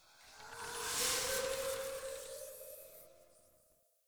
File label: Ancestor Tree Deactivate; Soul Plane